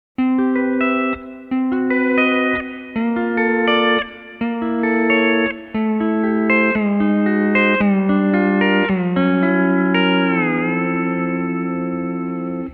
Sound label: Mixed E9th C6; D13 Copedant Tabs